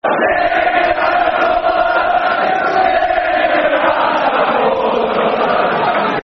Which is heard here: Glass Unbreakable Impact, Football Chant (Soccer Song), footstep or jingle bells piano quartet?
Football Chant (Soccer Song)